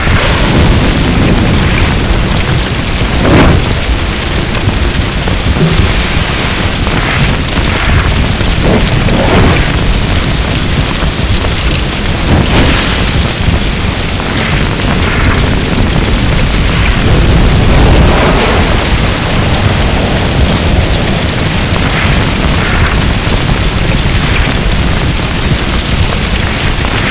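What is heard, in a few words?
fire metal large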